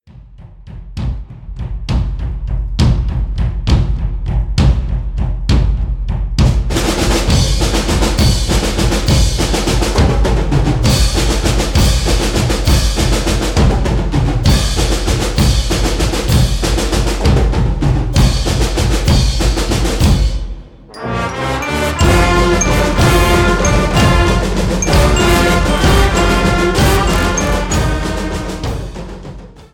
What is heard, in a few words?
walzer medley